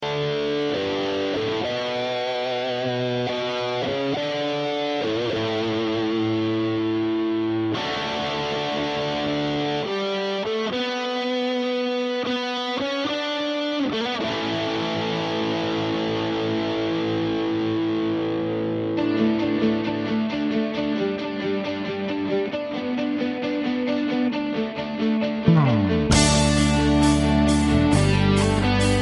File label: Rock Remix Ringtone